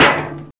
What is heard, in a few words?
Clang Hit, Industrial; One Shots; Drums; Misc; Sonic Foundry (Sony Creative Software)